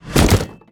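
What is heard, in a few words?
steampunk movement landing; smite; serqet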